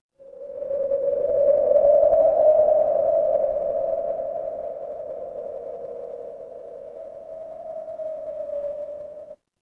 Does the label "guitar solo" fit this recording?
No